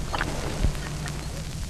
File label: FX Vinyl; RnB